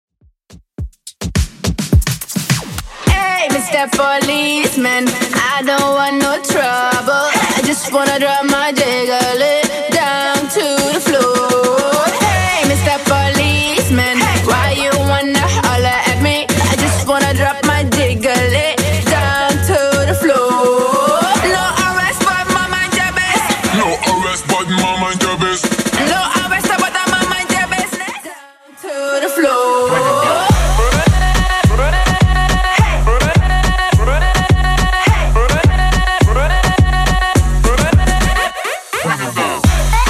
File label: 105 bpm